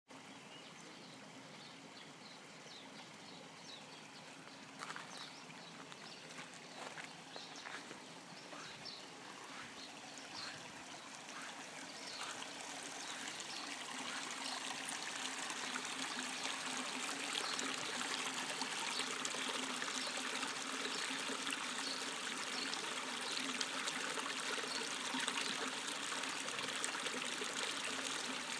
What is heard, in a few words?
water sounds